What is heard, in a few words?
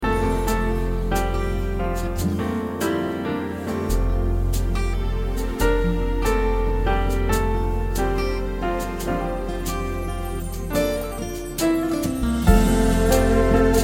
Away in a Manger Instrumental